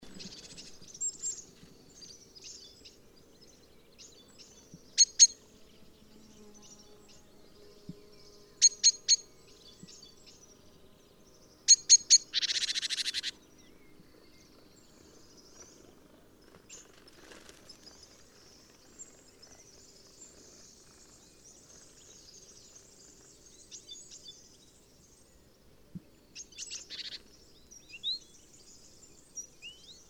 Parus major; SETE

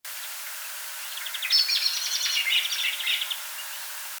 Turdus merula; SETE

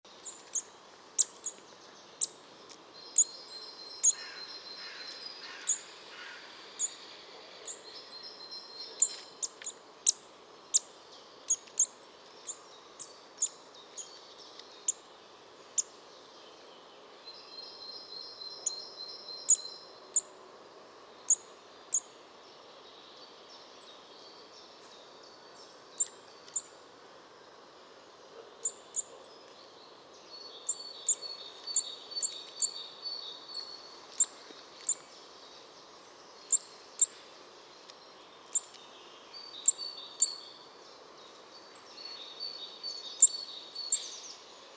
Sitta europaea; SETE

